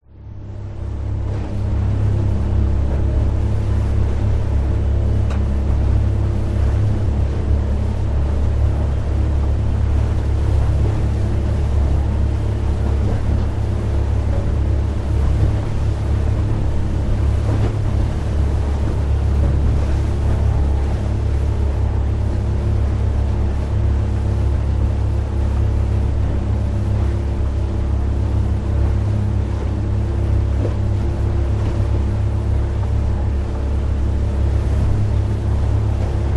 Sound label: vehicles boat ride waves caribbean waves splash motor hum